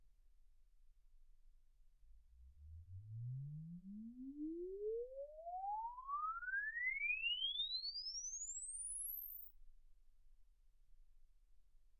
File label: exp swept sinus 10Hz 24kHz